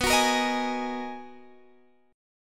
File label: B Major 13th B down Guitar Half Step Down